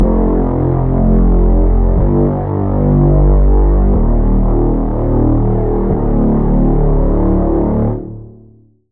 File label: Reese